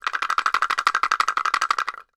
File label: kpoko, Kenya Kpoko Kpoko